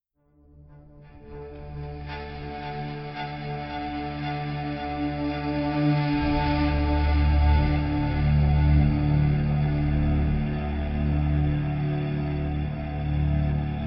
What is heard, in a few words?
combat cello mood; inFamous; PS3